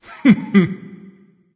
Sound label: heh heh; cuchulainn